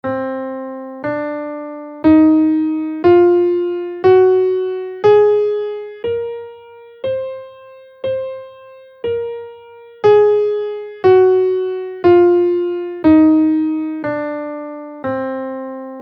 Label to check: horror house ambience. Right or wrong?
Wrong